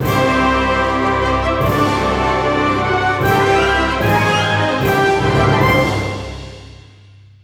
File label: Battle Cleared; Mario + Rabbids Kingdom Battle; Hoppers!